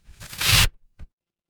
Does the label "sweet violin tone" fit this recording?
No